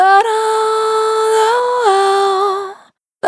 Vapor Dry, Single Voice Melodic Loops

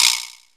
DRM C#, YAMAHA R5+RX; Best Service ProSamples vol.55 - Retro Sampler; AKAI

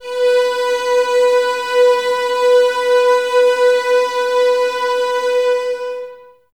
VS B 4, 11 Violins, Mute; Miroslav Vitous; String Ensembles

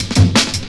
Ruffige Cut, Jungle, Drum Loops 165.5; Zero-G Jungle Warfare 1, 2 & 3

